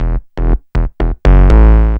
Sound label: BAS, 2 Step; USB Soundscan vol.51; House Side Of 2 Step; AKAI